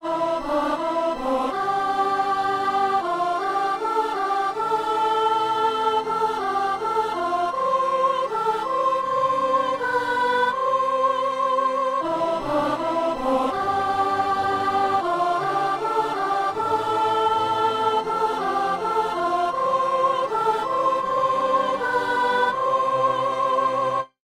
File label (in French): soliste puis soprano; Cantico dell'Agnello